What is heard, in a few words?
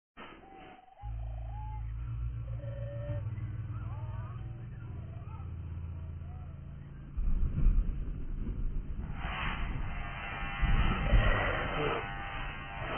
frying pan